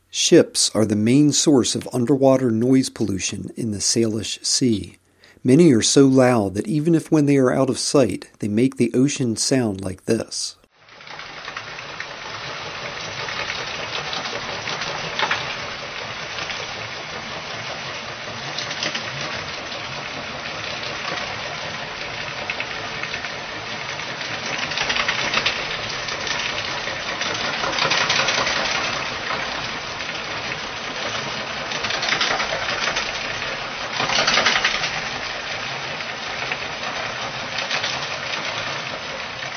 ship narrated

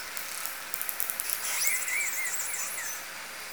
call, L pod, Orca; SRKW; Alwin; S10

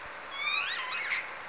call S14 (possible), OrcaCalls, SRKW; Alwin

